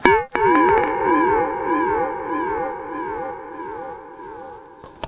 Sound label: SPACEY L, Funk Guitar; Zero G - Funk Guitar